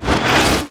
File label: Coffin Metal Sink; SFX Kit; Foley - Misc